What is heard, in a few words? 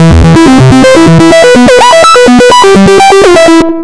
E 125, Synth Loops, Acidized; 125bpm; Zero-G; Soundclash Nu Dance Electro